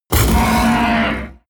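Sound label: coloss shout loud deep, creatures; kreaturen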